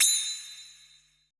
HI BELL, PERC; BPC PERC

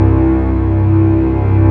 STR Orchestral Strings

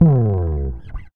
REAL BASS, BASSLICKS; Ueberschall; Jam Box

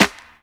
CLAPS HIP, CLP; Best Service Dance Mega Drums

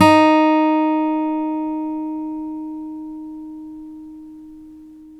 STEEL P D#3, Synclavier, GUITARS; Synclavier Sampler Library

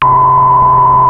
MG1 noise, Moog; OLD SYNTHS; Rubber Chicken Software Sample Bank

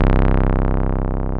303 SQR C1, ROLAND TB303; Big Fish Audio; First Call Vintage Keyboards

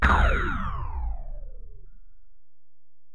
Tom, Alesis DM-PRO

